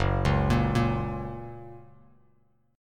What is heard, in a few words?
Gb Major, Bass Standard; Gb arpeggiated; JGuitar